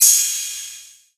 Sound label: Crash Short; pitchglitch samples; LexLuger